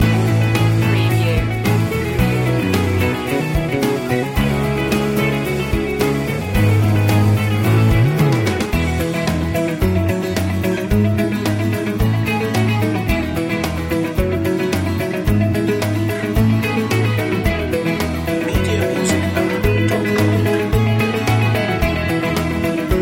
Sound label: motivational living loop